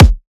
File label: Riddim Kick